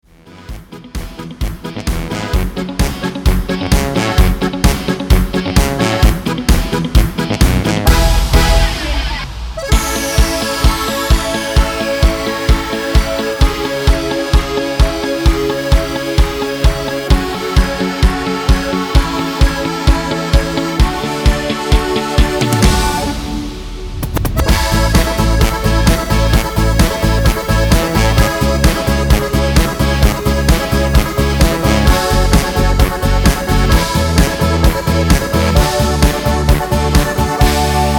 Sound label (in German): A-Dur ohne Chor